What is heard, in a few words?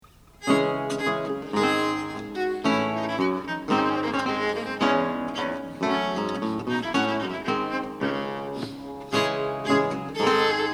partita